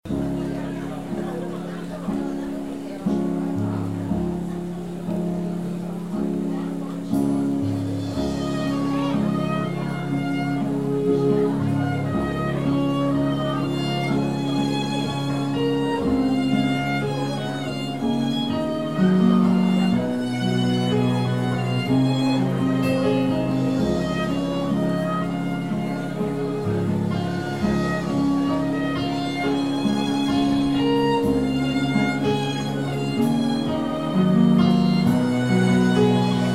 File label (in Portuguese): Piano e Cordas